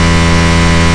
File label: ENGINES; Sbike